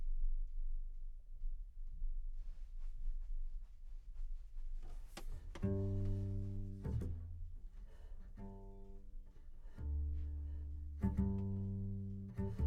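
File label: Contrabass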